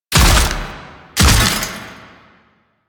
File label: Finisher Foley; Enemies; Finishers; Dax; Warframe; Duviri